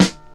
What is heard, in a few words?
smooth snare sound d key